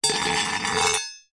household kitchen knife large blade loose scrape on solid surface; zapsplat